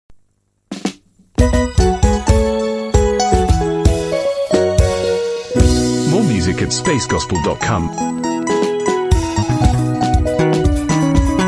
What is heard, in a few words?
protestant trainees choir; Kenya Prisons Staff Training College